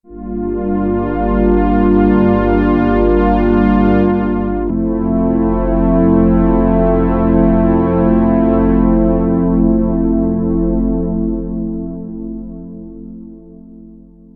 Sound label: planar; ambika